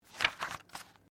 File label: Book Page Turn